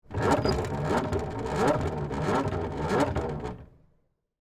Hand Crank Voltage, Hand, Crank, Low, Voltage, Device; Foley; Miscellaneous Electronic Sound Effects